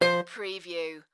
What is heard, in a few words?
Casino Electronic Sound Tone